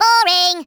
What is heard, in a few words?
Worms boring